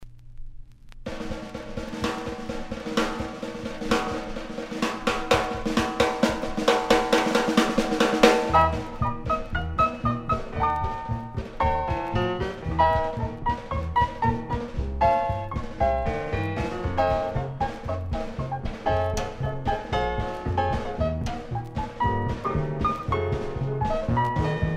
jazz

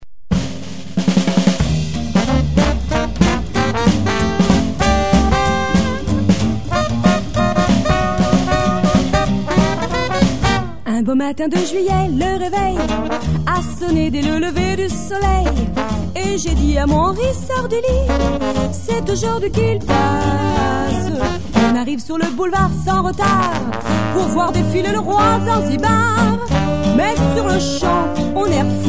jazz band

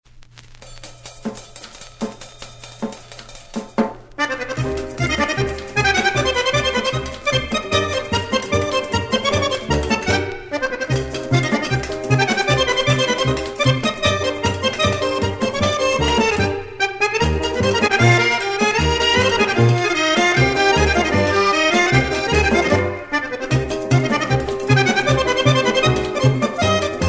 samba

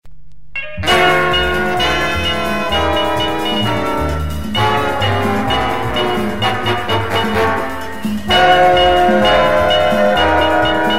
sax

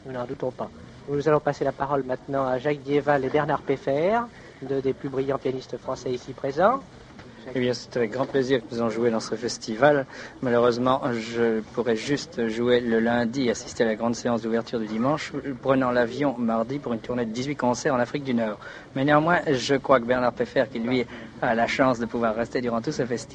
jazz festival; EMISSION RADIO FESTIVAL INTERNATIONAL DE JAZZ 1949 SALLE PLEYEL

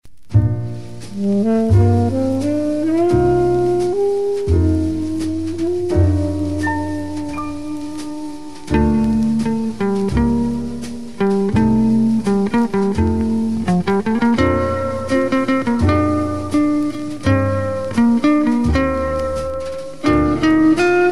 guitar

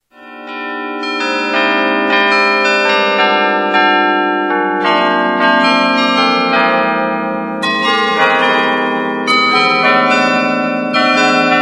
glockenspiel

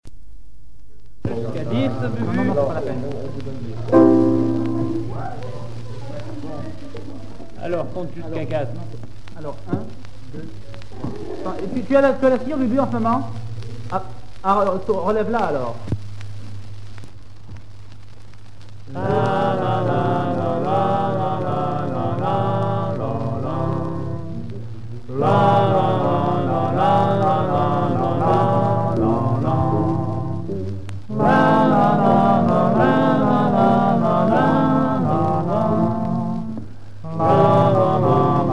vocal

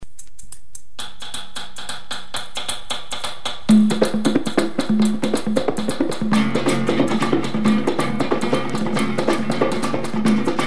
mazurka

